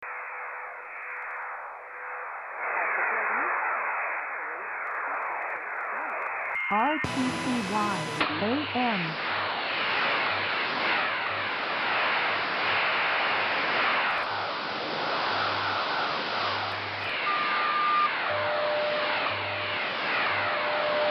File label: 15mhz, audio files on hf